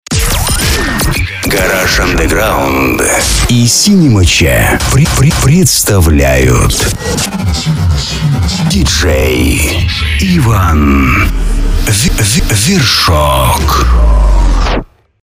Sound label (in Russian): Джингл